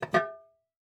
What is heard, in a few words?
Household Cookie Jar Remove Lid Ceramic